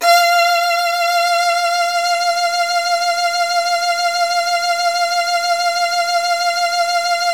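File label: violin, Virtuoso Strings, SOLO VN, F4; ILIO; Kirk Hunter Virtuoso Strings VOL-1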